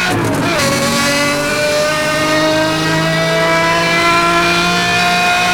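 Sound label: third, car engine; sgmcars; zondac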